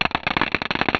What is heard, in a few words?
tick